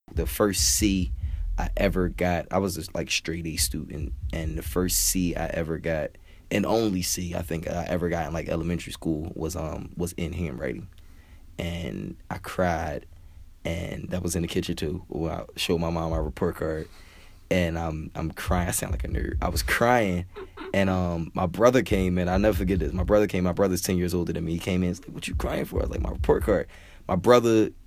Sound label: Chill Moody